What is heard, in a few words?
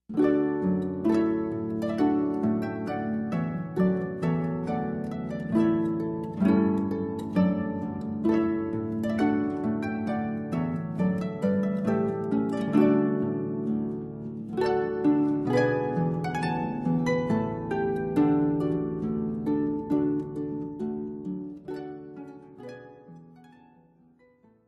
Trumpet